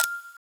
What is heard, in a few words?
confirm